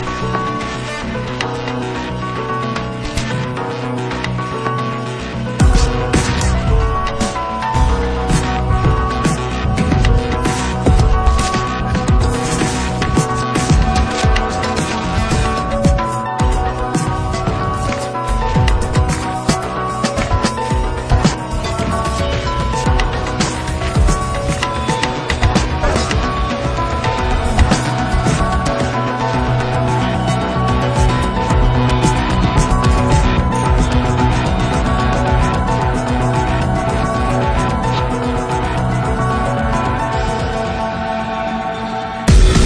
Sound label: arpeggio